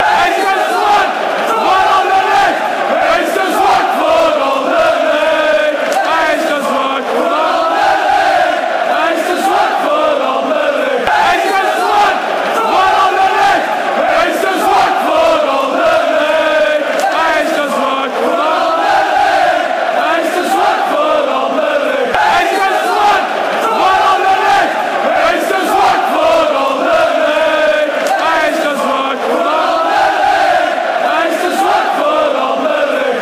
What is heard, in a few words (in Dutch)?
te zwak voor anderlecht